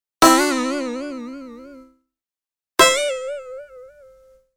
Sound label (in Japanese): biyon